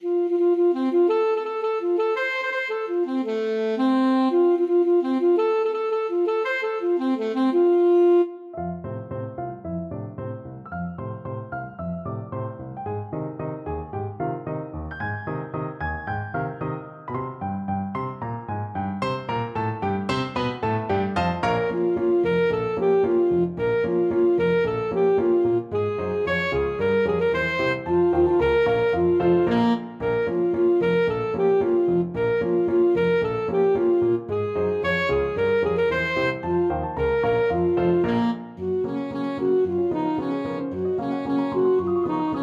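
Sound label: alto saxophone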